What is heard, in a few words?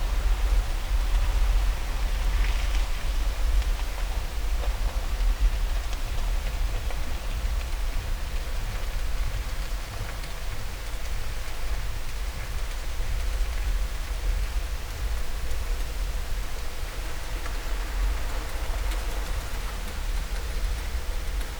Heaving To, ships